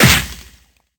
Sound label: Punch short